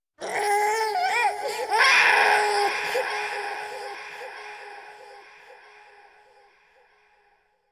baby cry

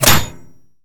Bullet Impact Metal Heavy

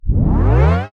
Tape Start

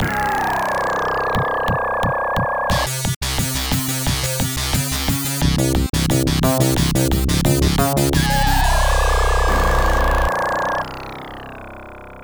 Win (Stage Clear)

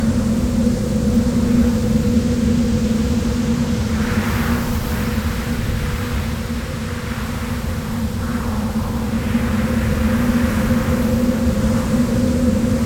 SandStorm Loop; Nozdormu

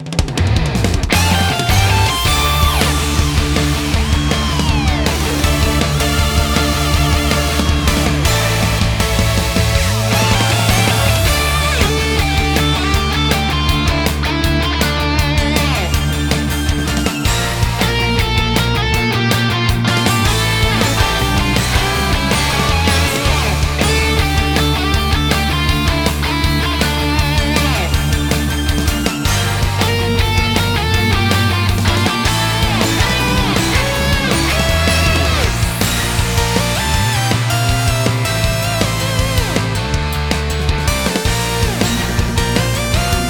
Battle

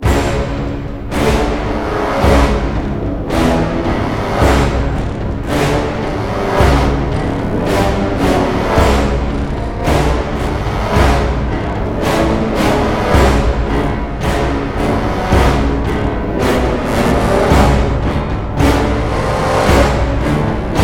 Mine Nodule Beat; Crysis

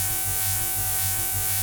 Laser Loop; Lasrbeam

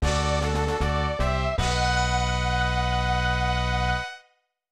Victory!; Dual Orb; SNES

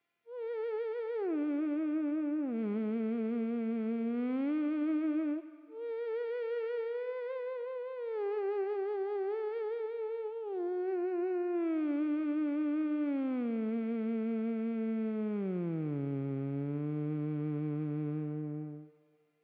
Theremin; Hylics

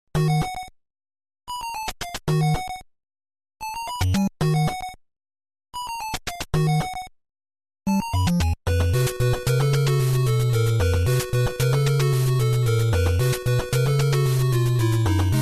Underground Cave; Adventure Island 4; NES

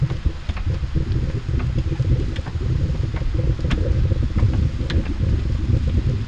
Lava Flow Bubbly

